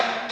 VOICE SD, snare drum; SNAREDRUMS

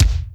BD, bass drum

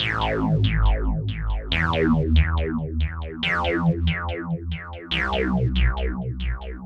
synth tekno 140 A; Techno2; Keys

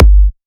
kick, drum hits; Anthemic

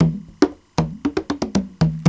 CONGA 117, PERCUS LOOPS

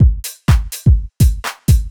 DEEPHOUSE BEATS 125

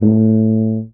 Contrabass Tuba Straight A1; DirectWave; Varazdin Orchestral

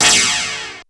LASER; FX PERCUSSN

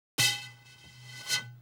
bottle, ethno, 125

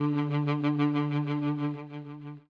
OLDSCHOOL BRASS 96

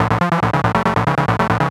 TECHNO SYNTH 140; Keys

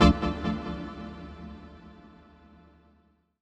TECHNO EXTRA 140

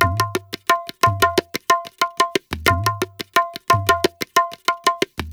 ETHNO EXTRA 90; ethno2

